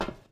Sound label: footstep metal